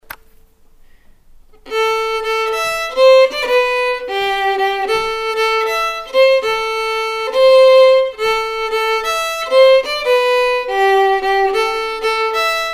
a minor, fiddle; Stingo

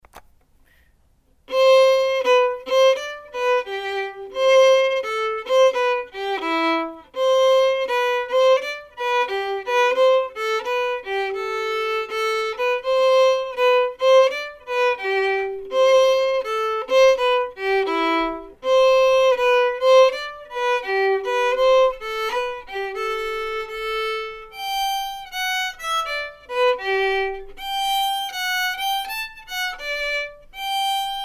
Bacca Pipes, fiddle

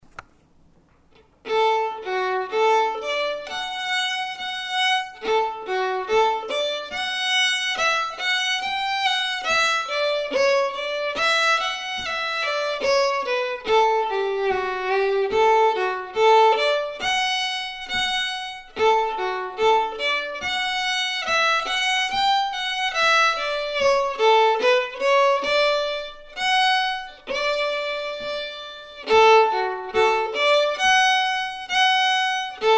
Barn Dance in D, fiddle

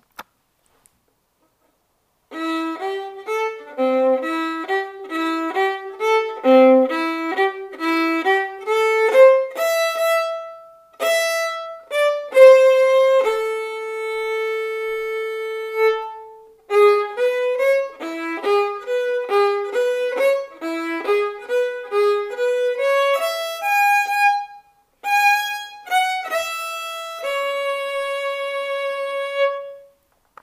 rag slow, fiddle

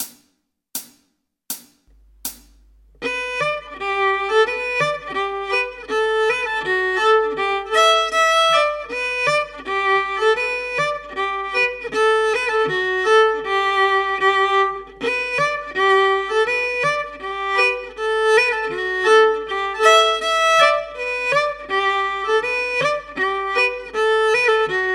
Murroe Polka, solo fiddle

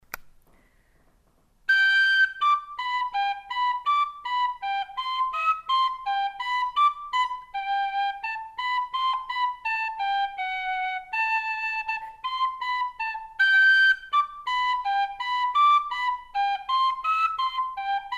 whistle

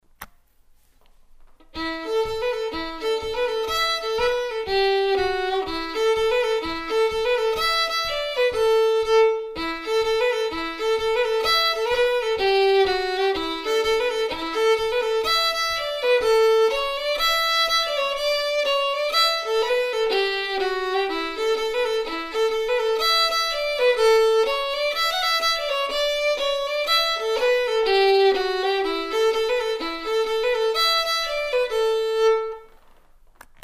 a minor slide, fiddle

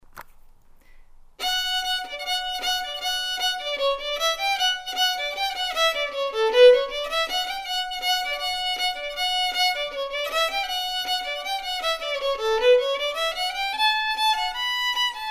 Fairy Dance, fiddle

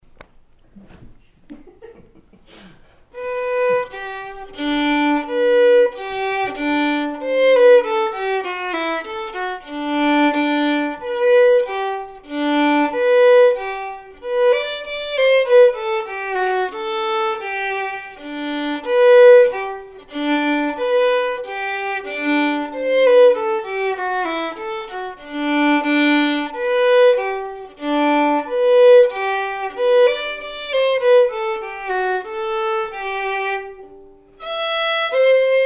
Waltz G, fiddle; Miss Margaret Hills Waltz

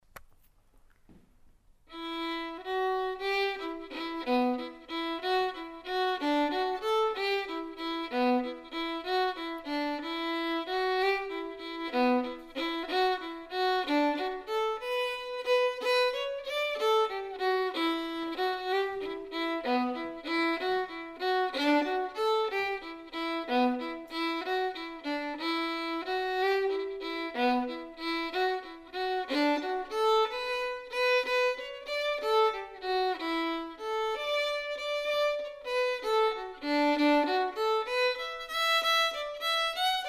Jig, fiddle; Dublin Jig

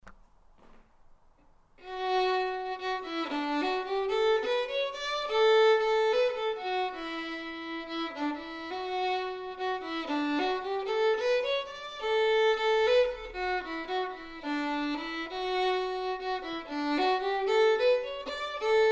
Kerfunken Jig, fiddle

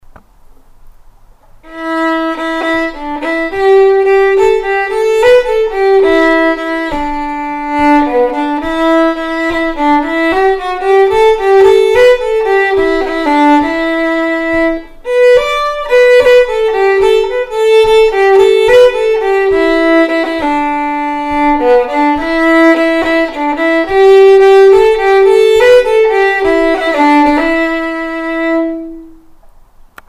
waltz, fiddle; Star of County Down

